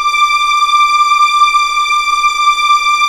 D6 EXP MF, VIOLINS; East West; The Ultimate String Collection

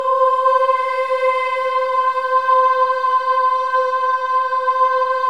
OH EH, Symphony of Voices; Spectrasonics

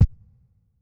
Kit, breakcore Drum Hits

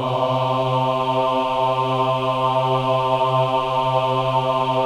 CHOIR AHS, AH C3; Spectrasonics; Symphony of Voices

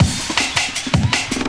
LOOP L, Drum & Bass 160 BPM

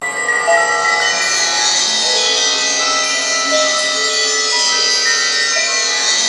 A#3 WINDY; Northstar; The Wizard VOL-1; BELLTASY

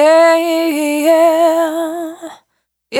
Urban Dry, Single Voice Melodic Loops; Pocket Diva World Class Vocal Samples

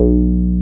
BAS 303 B2

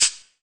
R SHAKER, Drum Mashines; EdgeSounds; Drum Mashines VOL-1; R-100 DRUMS

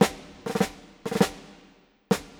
Snare, Orchestral; Sonic Foundry (Sony Creative Software); Orchestral VOL-2; Modern 06

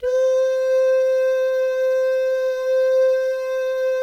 DOO MIX, Vocal Planet Jazz, GUY GAL

